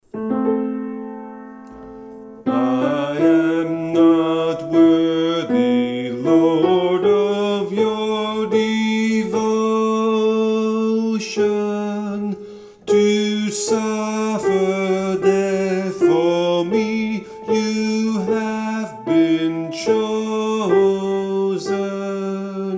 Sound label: Alto 1st verse